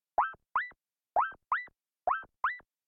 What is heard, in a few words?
Call Dialing